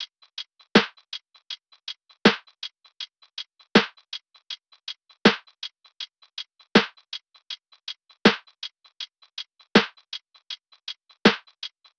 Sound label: Top Drum Loop, 80 BPM; Cymatics; Eternity Sample Pack